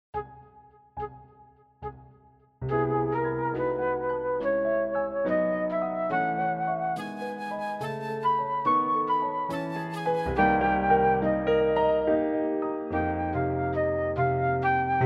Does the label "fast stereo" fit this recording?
Yes